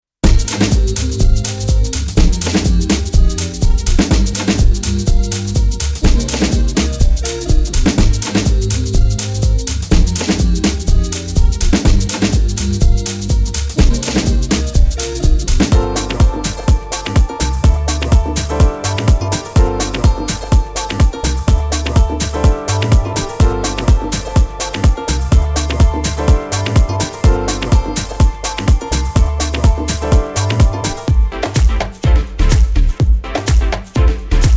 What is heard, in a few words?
BEAT LOOP